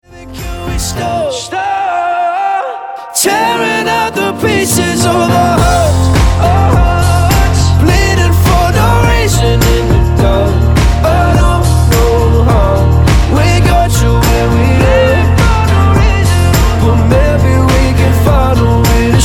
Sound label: Piano Showreel